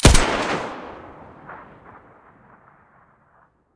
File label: sa58 muzzle, weapons; STALKER; IWP